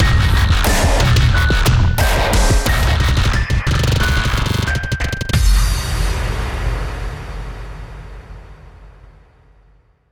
Dramatic Event

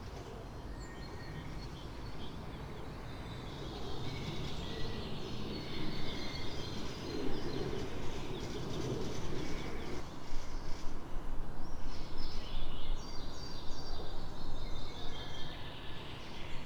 Ambience Birds; Content Warning

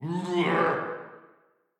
INTIMIDATE Grunt; Prison Architect

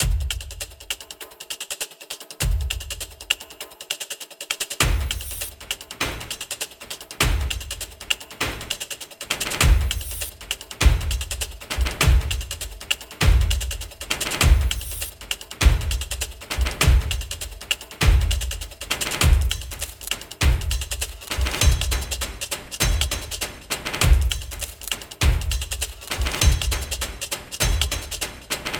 Percussion Loop Fast Version; Dual Blade Battle of the Female Ninja

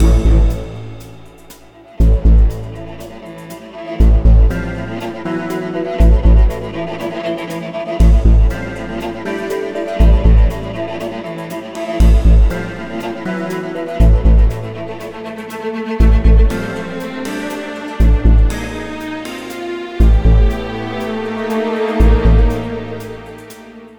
light tension; Light Apprentice: The Comic Book RPG Volume 1; macos windows gamerip 2017

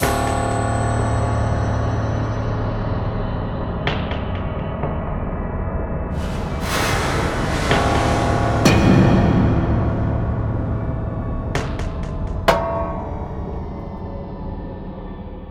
Basic Mood Fabrica

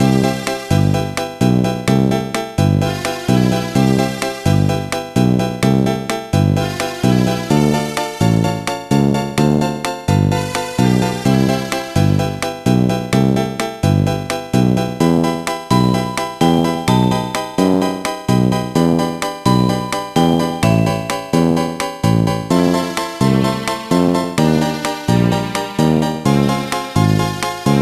Elevator Music; X-Men The Official Game; GBA

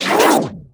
Bionic Man Sound start

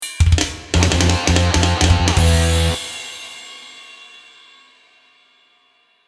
dark lord fail

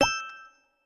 Match; Ellen's Garden Restoration; android

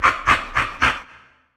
Dog Place

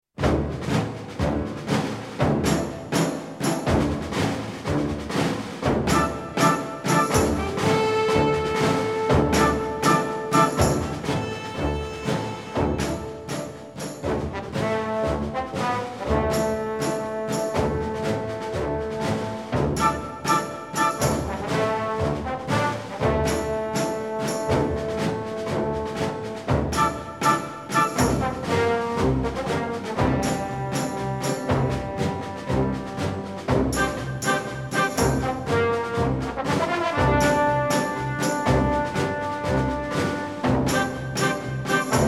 Battle March; Banner of the Maid

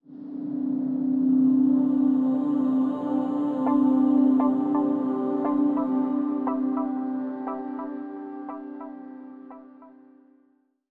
Stinger Laboratory

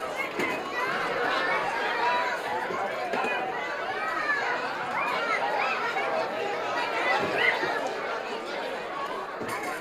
kids party, ambience; Birthday Party Bash; Wii; Spanish

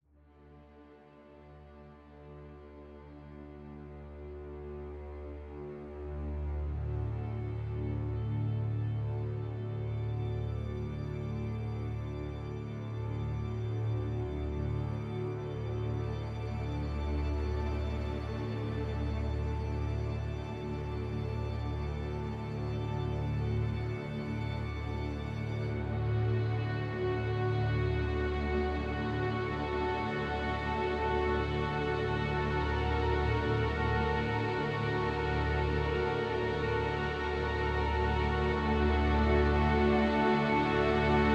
Camp Strings A

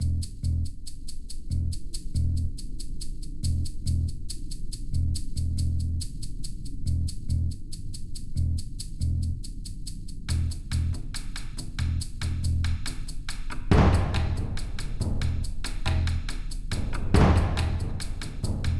Team Assault Loop; Puppet Kings

